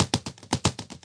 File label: 115 Found Percussion; 074 to 128 bpm